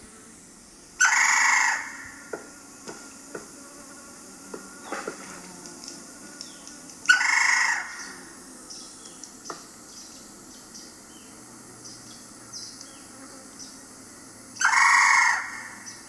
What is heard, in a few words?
Woodpecker